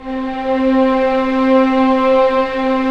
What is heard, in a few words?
STR, Vcs p Orch; STR Vcs 7 Orch